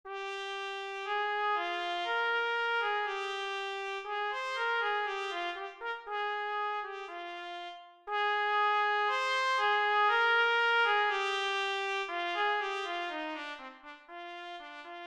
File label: trumpet etude